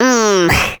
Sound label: Classic Hit Sound; Jolly Battle; Android